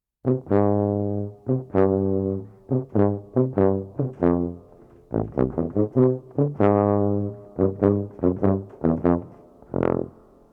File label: tuba